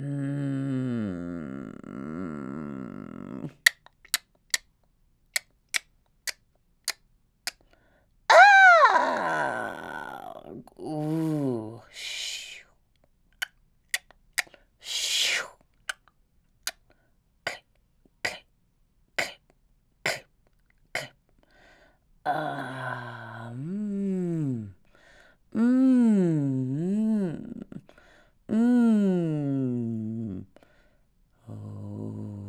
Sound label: Spider